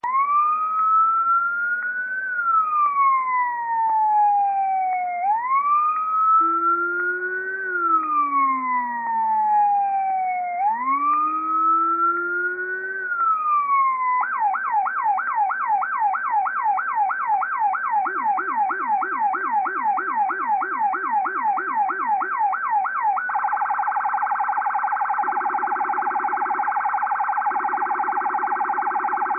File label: police